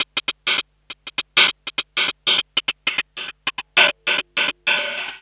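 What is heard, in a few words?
MINX hi-hat; Big Fish Audio; Uncivilised G'rooves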